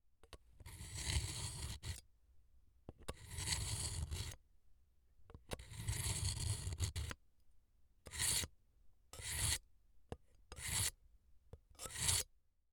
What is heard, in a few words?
Sharpening A Knife